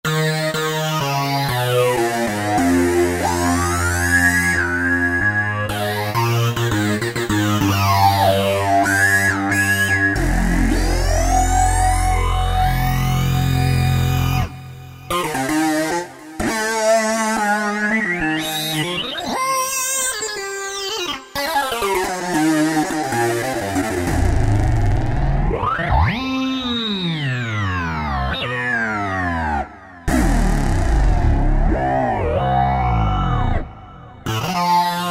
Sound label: Delicate Lead, Roland JD-990; Factory Patches